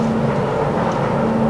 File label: Motor Bulldozer; Working Model Program